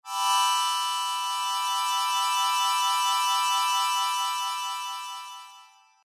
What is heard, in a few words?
Sho